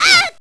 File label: oou; chunli